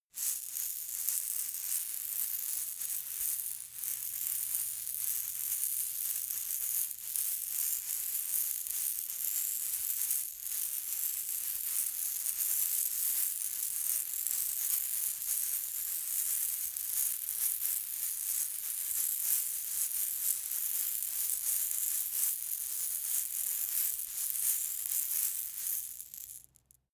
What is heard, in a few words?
shaker plastic egg constant rustle